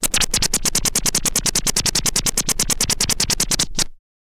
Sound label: scratch short, Vinyl scratches